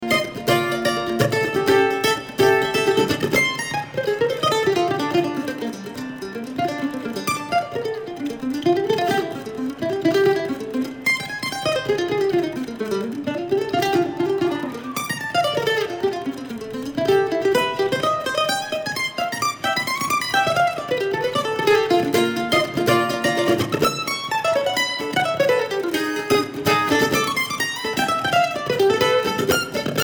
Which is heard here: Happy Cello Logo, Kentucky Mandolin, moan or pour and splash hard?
Kentucky Mandolin